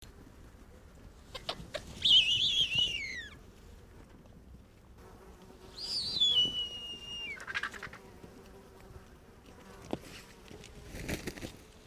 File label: Bearded Vulture Gypaetus barbatus